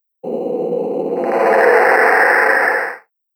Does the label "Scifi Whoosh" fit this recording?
Yes